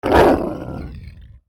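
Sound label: ATTACK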